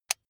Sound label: electric button drill direction switch lock heavy